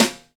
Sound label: New Jazz Snare; Snares